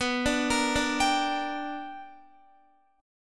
B Minor Major 7th Sharp 5th Guitar; arpeggiated; JGuitar

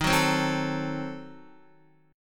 Eb Diminished 7th, Guitar; Ebdim7 strummed; 4F,4A,5C,5G,5C,6E; JGuitar

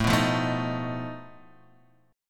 F 7th Flat 5th A

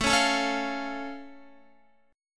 A Augmented A down Guitar; strummed; JGuitar

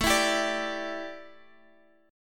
A 7th Suspended 4th A, Guitar Half Step Down

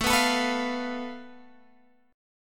A Suspended 2nd Sharp 5th, down, Guitar, Open G

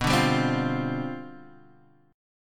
G 11th B

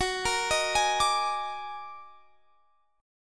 Eb Minor Major 11th

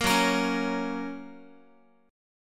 F sharp Major, Guitar; strummed; 13,14,13,11,x,x; 4A,4E,5A,5D,5G#,6C#

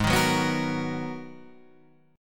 G sharp Minor 9th G sharp; G#m9 strummed; 4,6,4,4,7,6; JGuitar

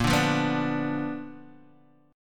B-Minor Major 7th Flat 5th Bb

